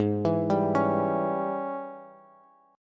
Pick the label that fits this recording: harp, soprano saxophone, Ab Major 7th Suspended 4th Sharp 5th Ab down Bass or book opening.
Ab Major 7th Suspended 4th Sharp 5th Ab down Bass